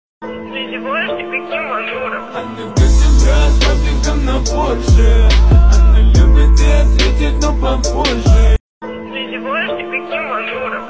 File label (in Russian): Песня